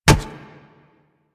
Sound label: Kubrow Run Metal, Footsteps; Enemies; Warframe